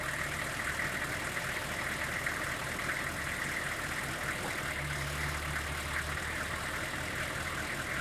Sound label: truck; Golden Crayon Sounds